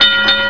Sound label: ALARM; Busy people of hamsterland